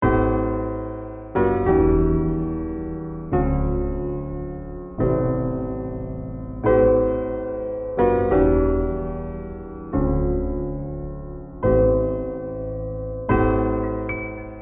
ringtone

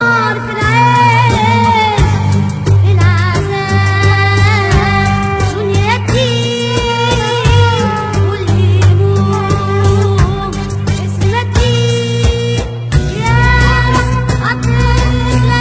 iraq superhit song ringtone

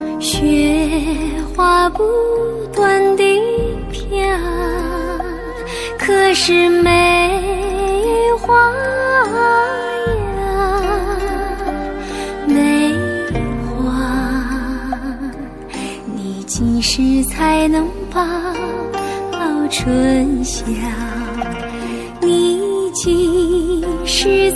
new beautiful chinese song ringtone

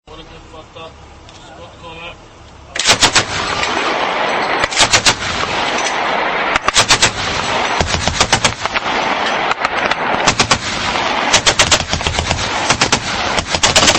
20mm auto canon

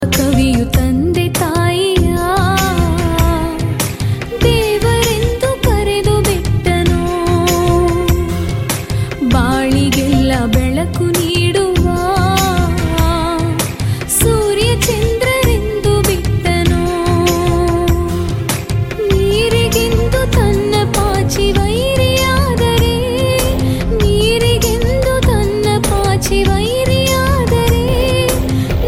female verison; ringtone

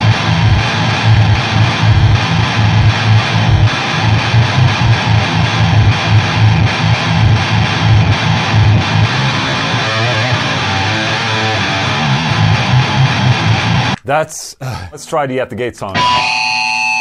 swedish high volume guitar

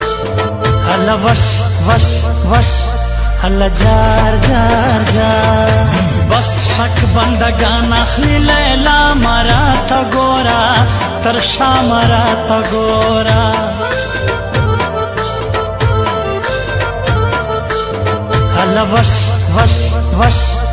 pashto ringtone

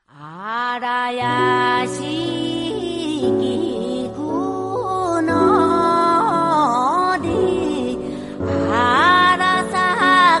old japanese song

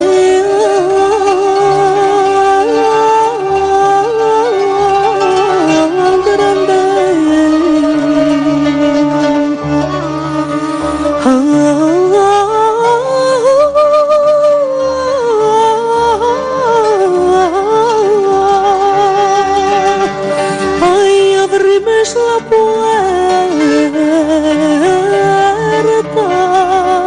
arabic moroccan ringtone

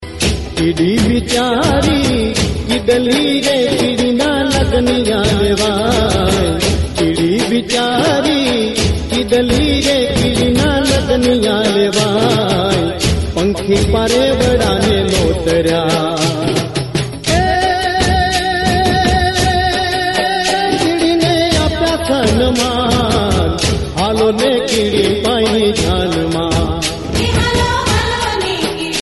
gujarati garbo ringtone

